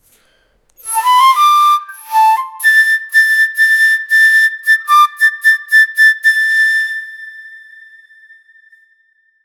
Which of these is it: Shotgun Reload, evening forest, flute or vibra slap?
flute